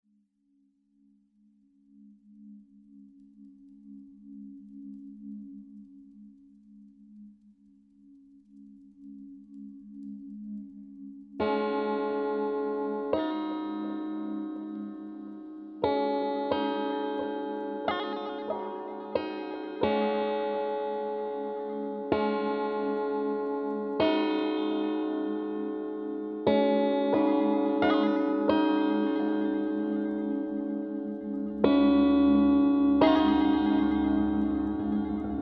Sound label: Bouncy Guitar; Bouncy Guitar Monkey Herd